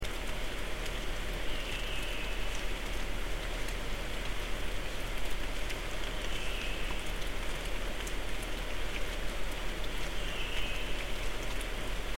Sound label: mystery call